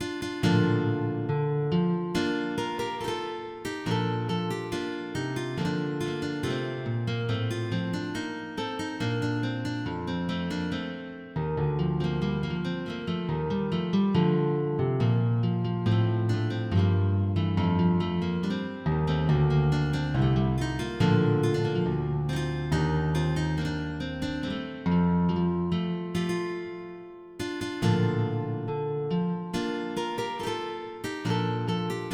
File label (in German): Allemande g moll